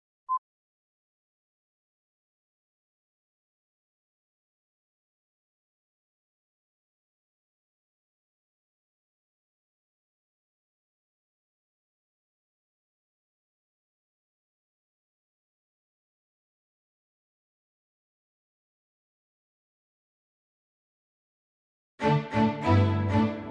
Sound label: Ocean Sailing, Synth Strings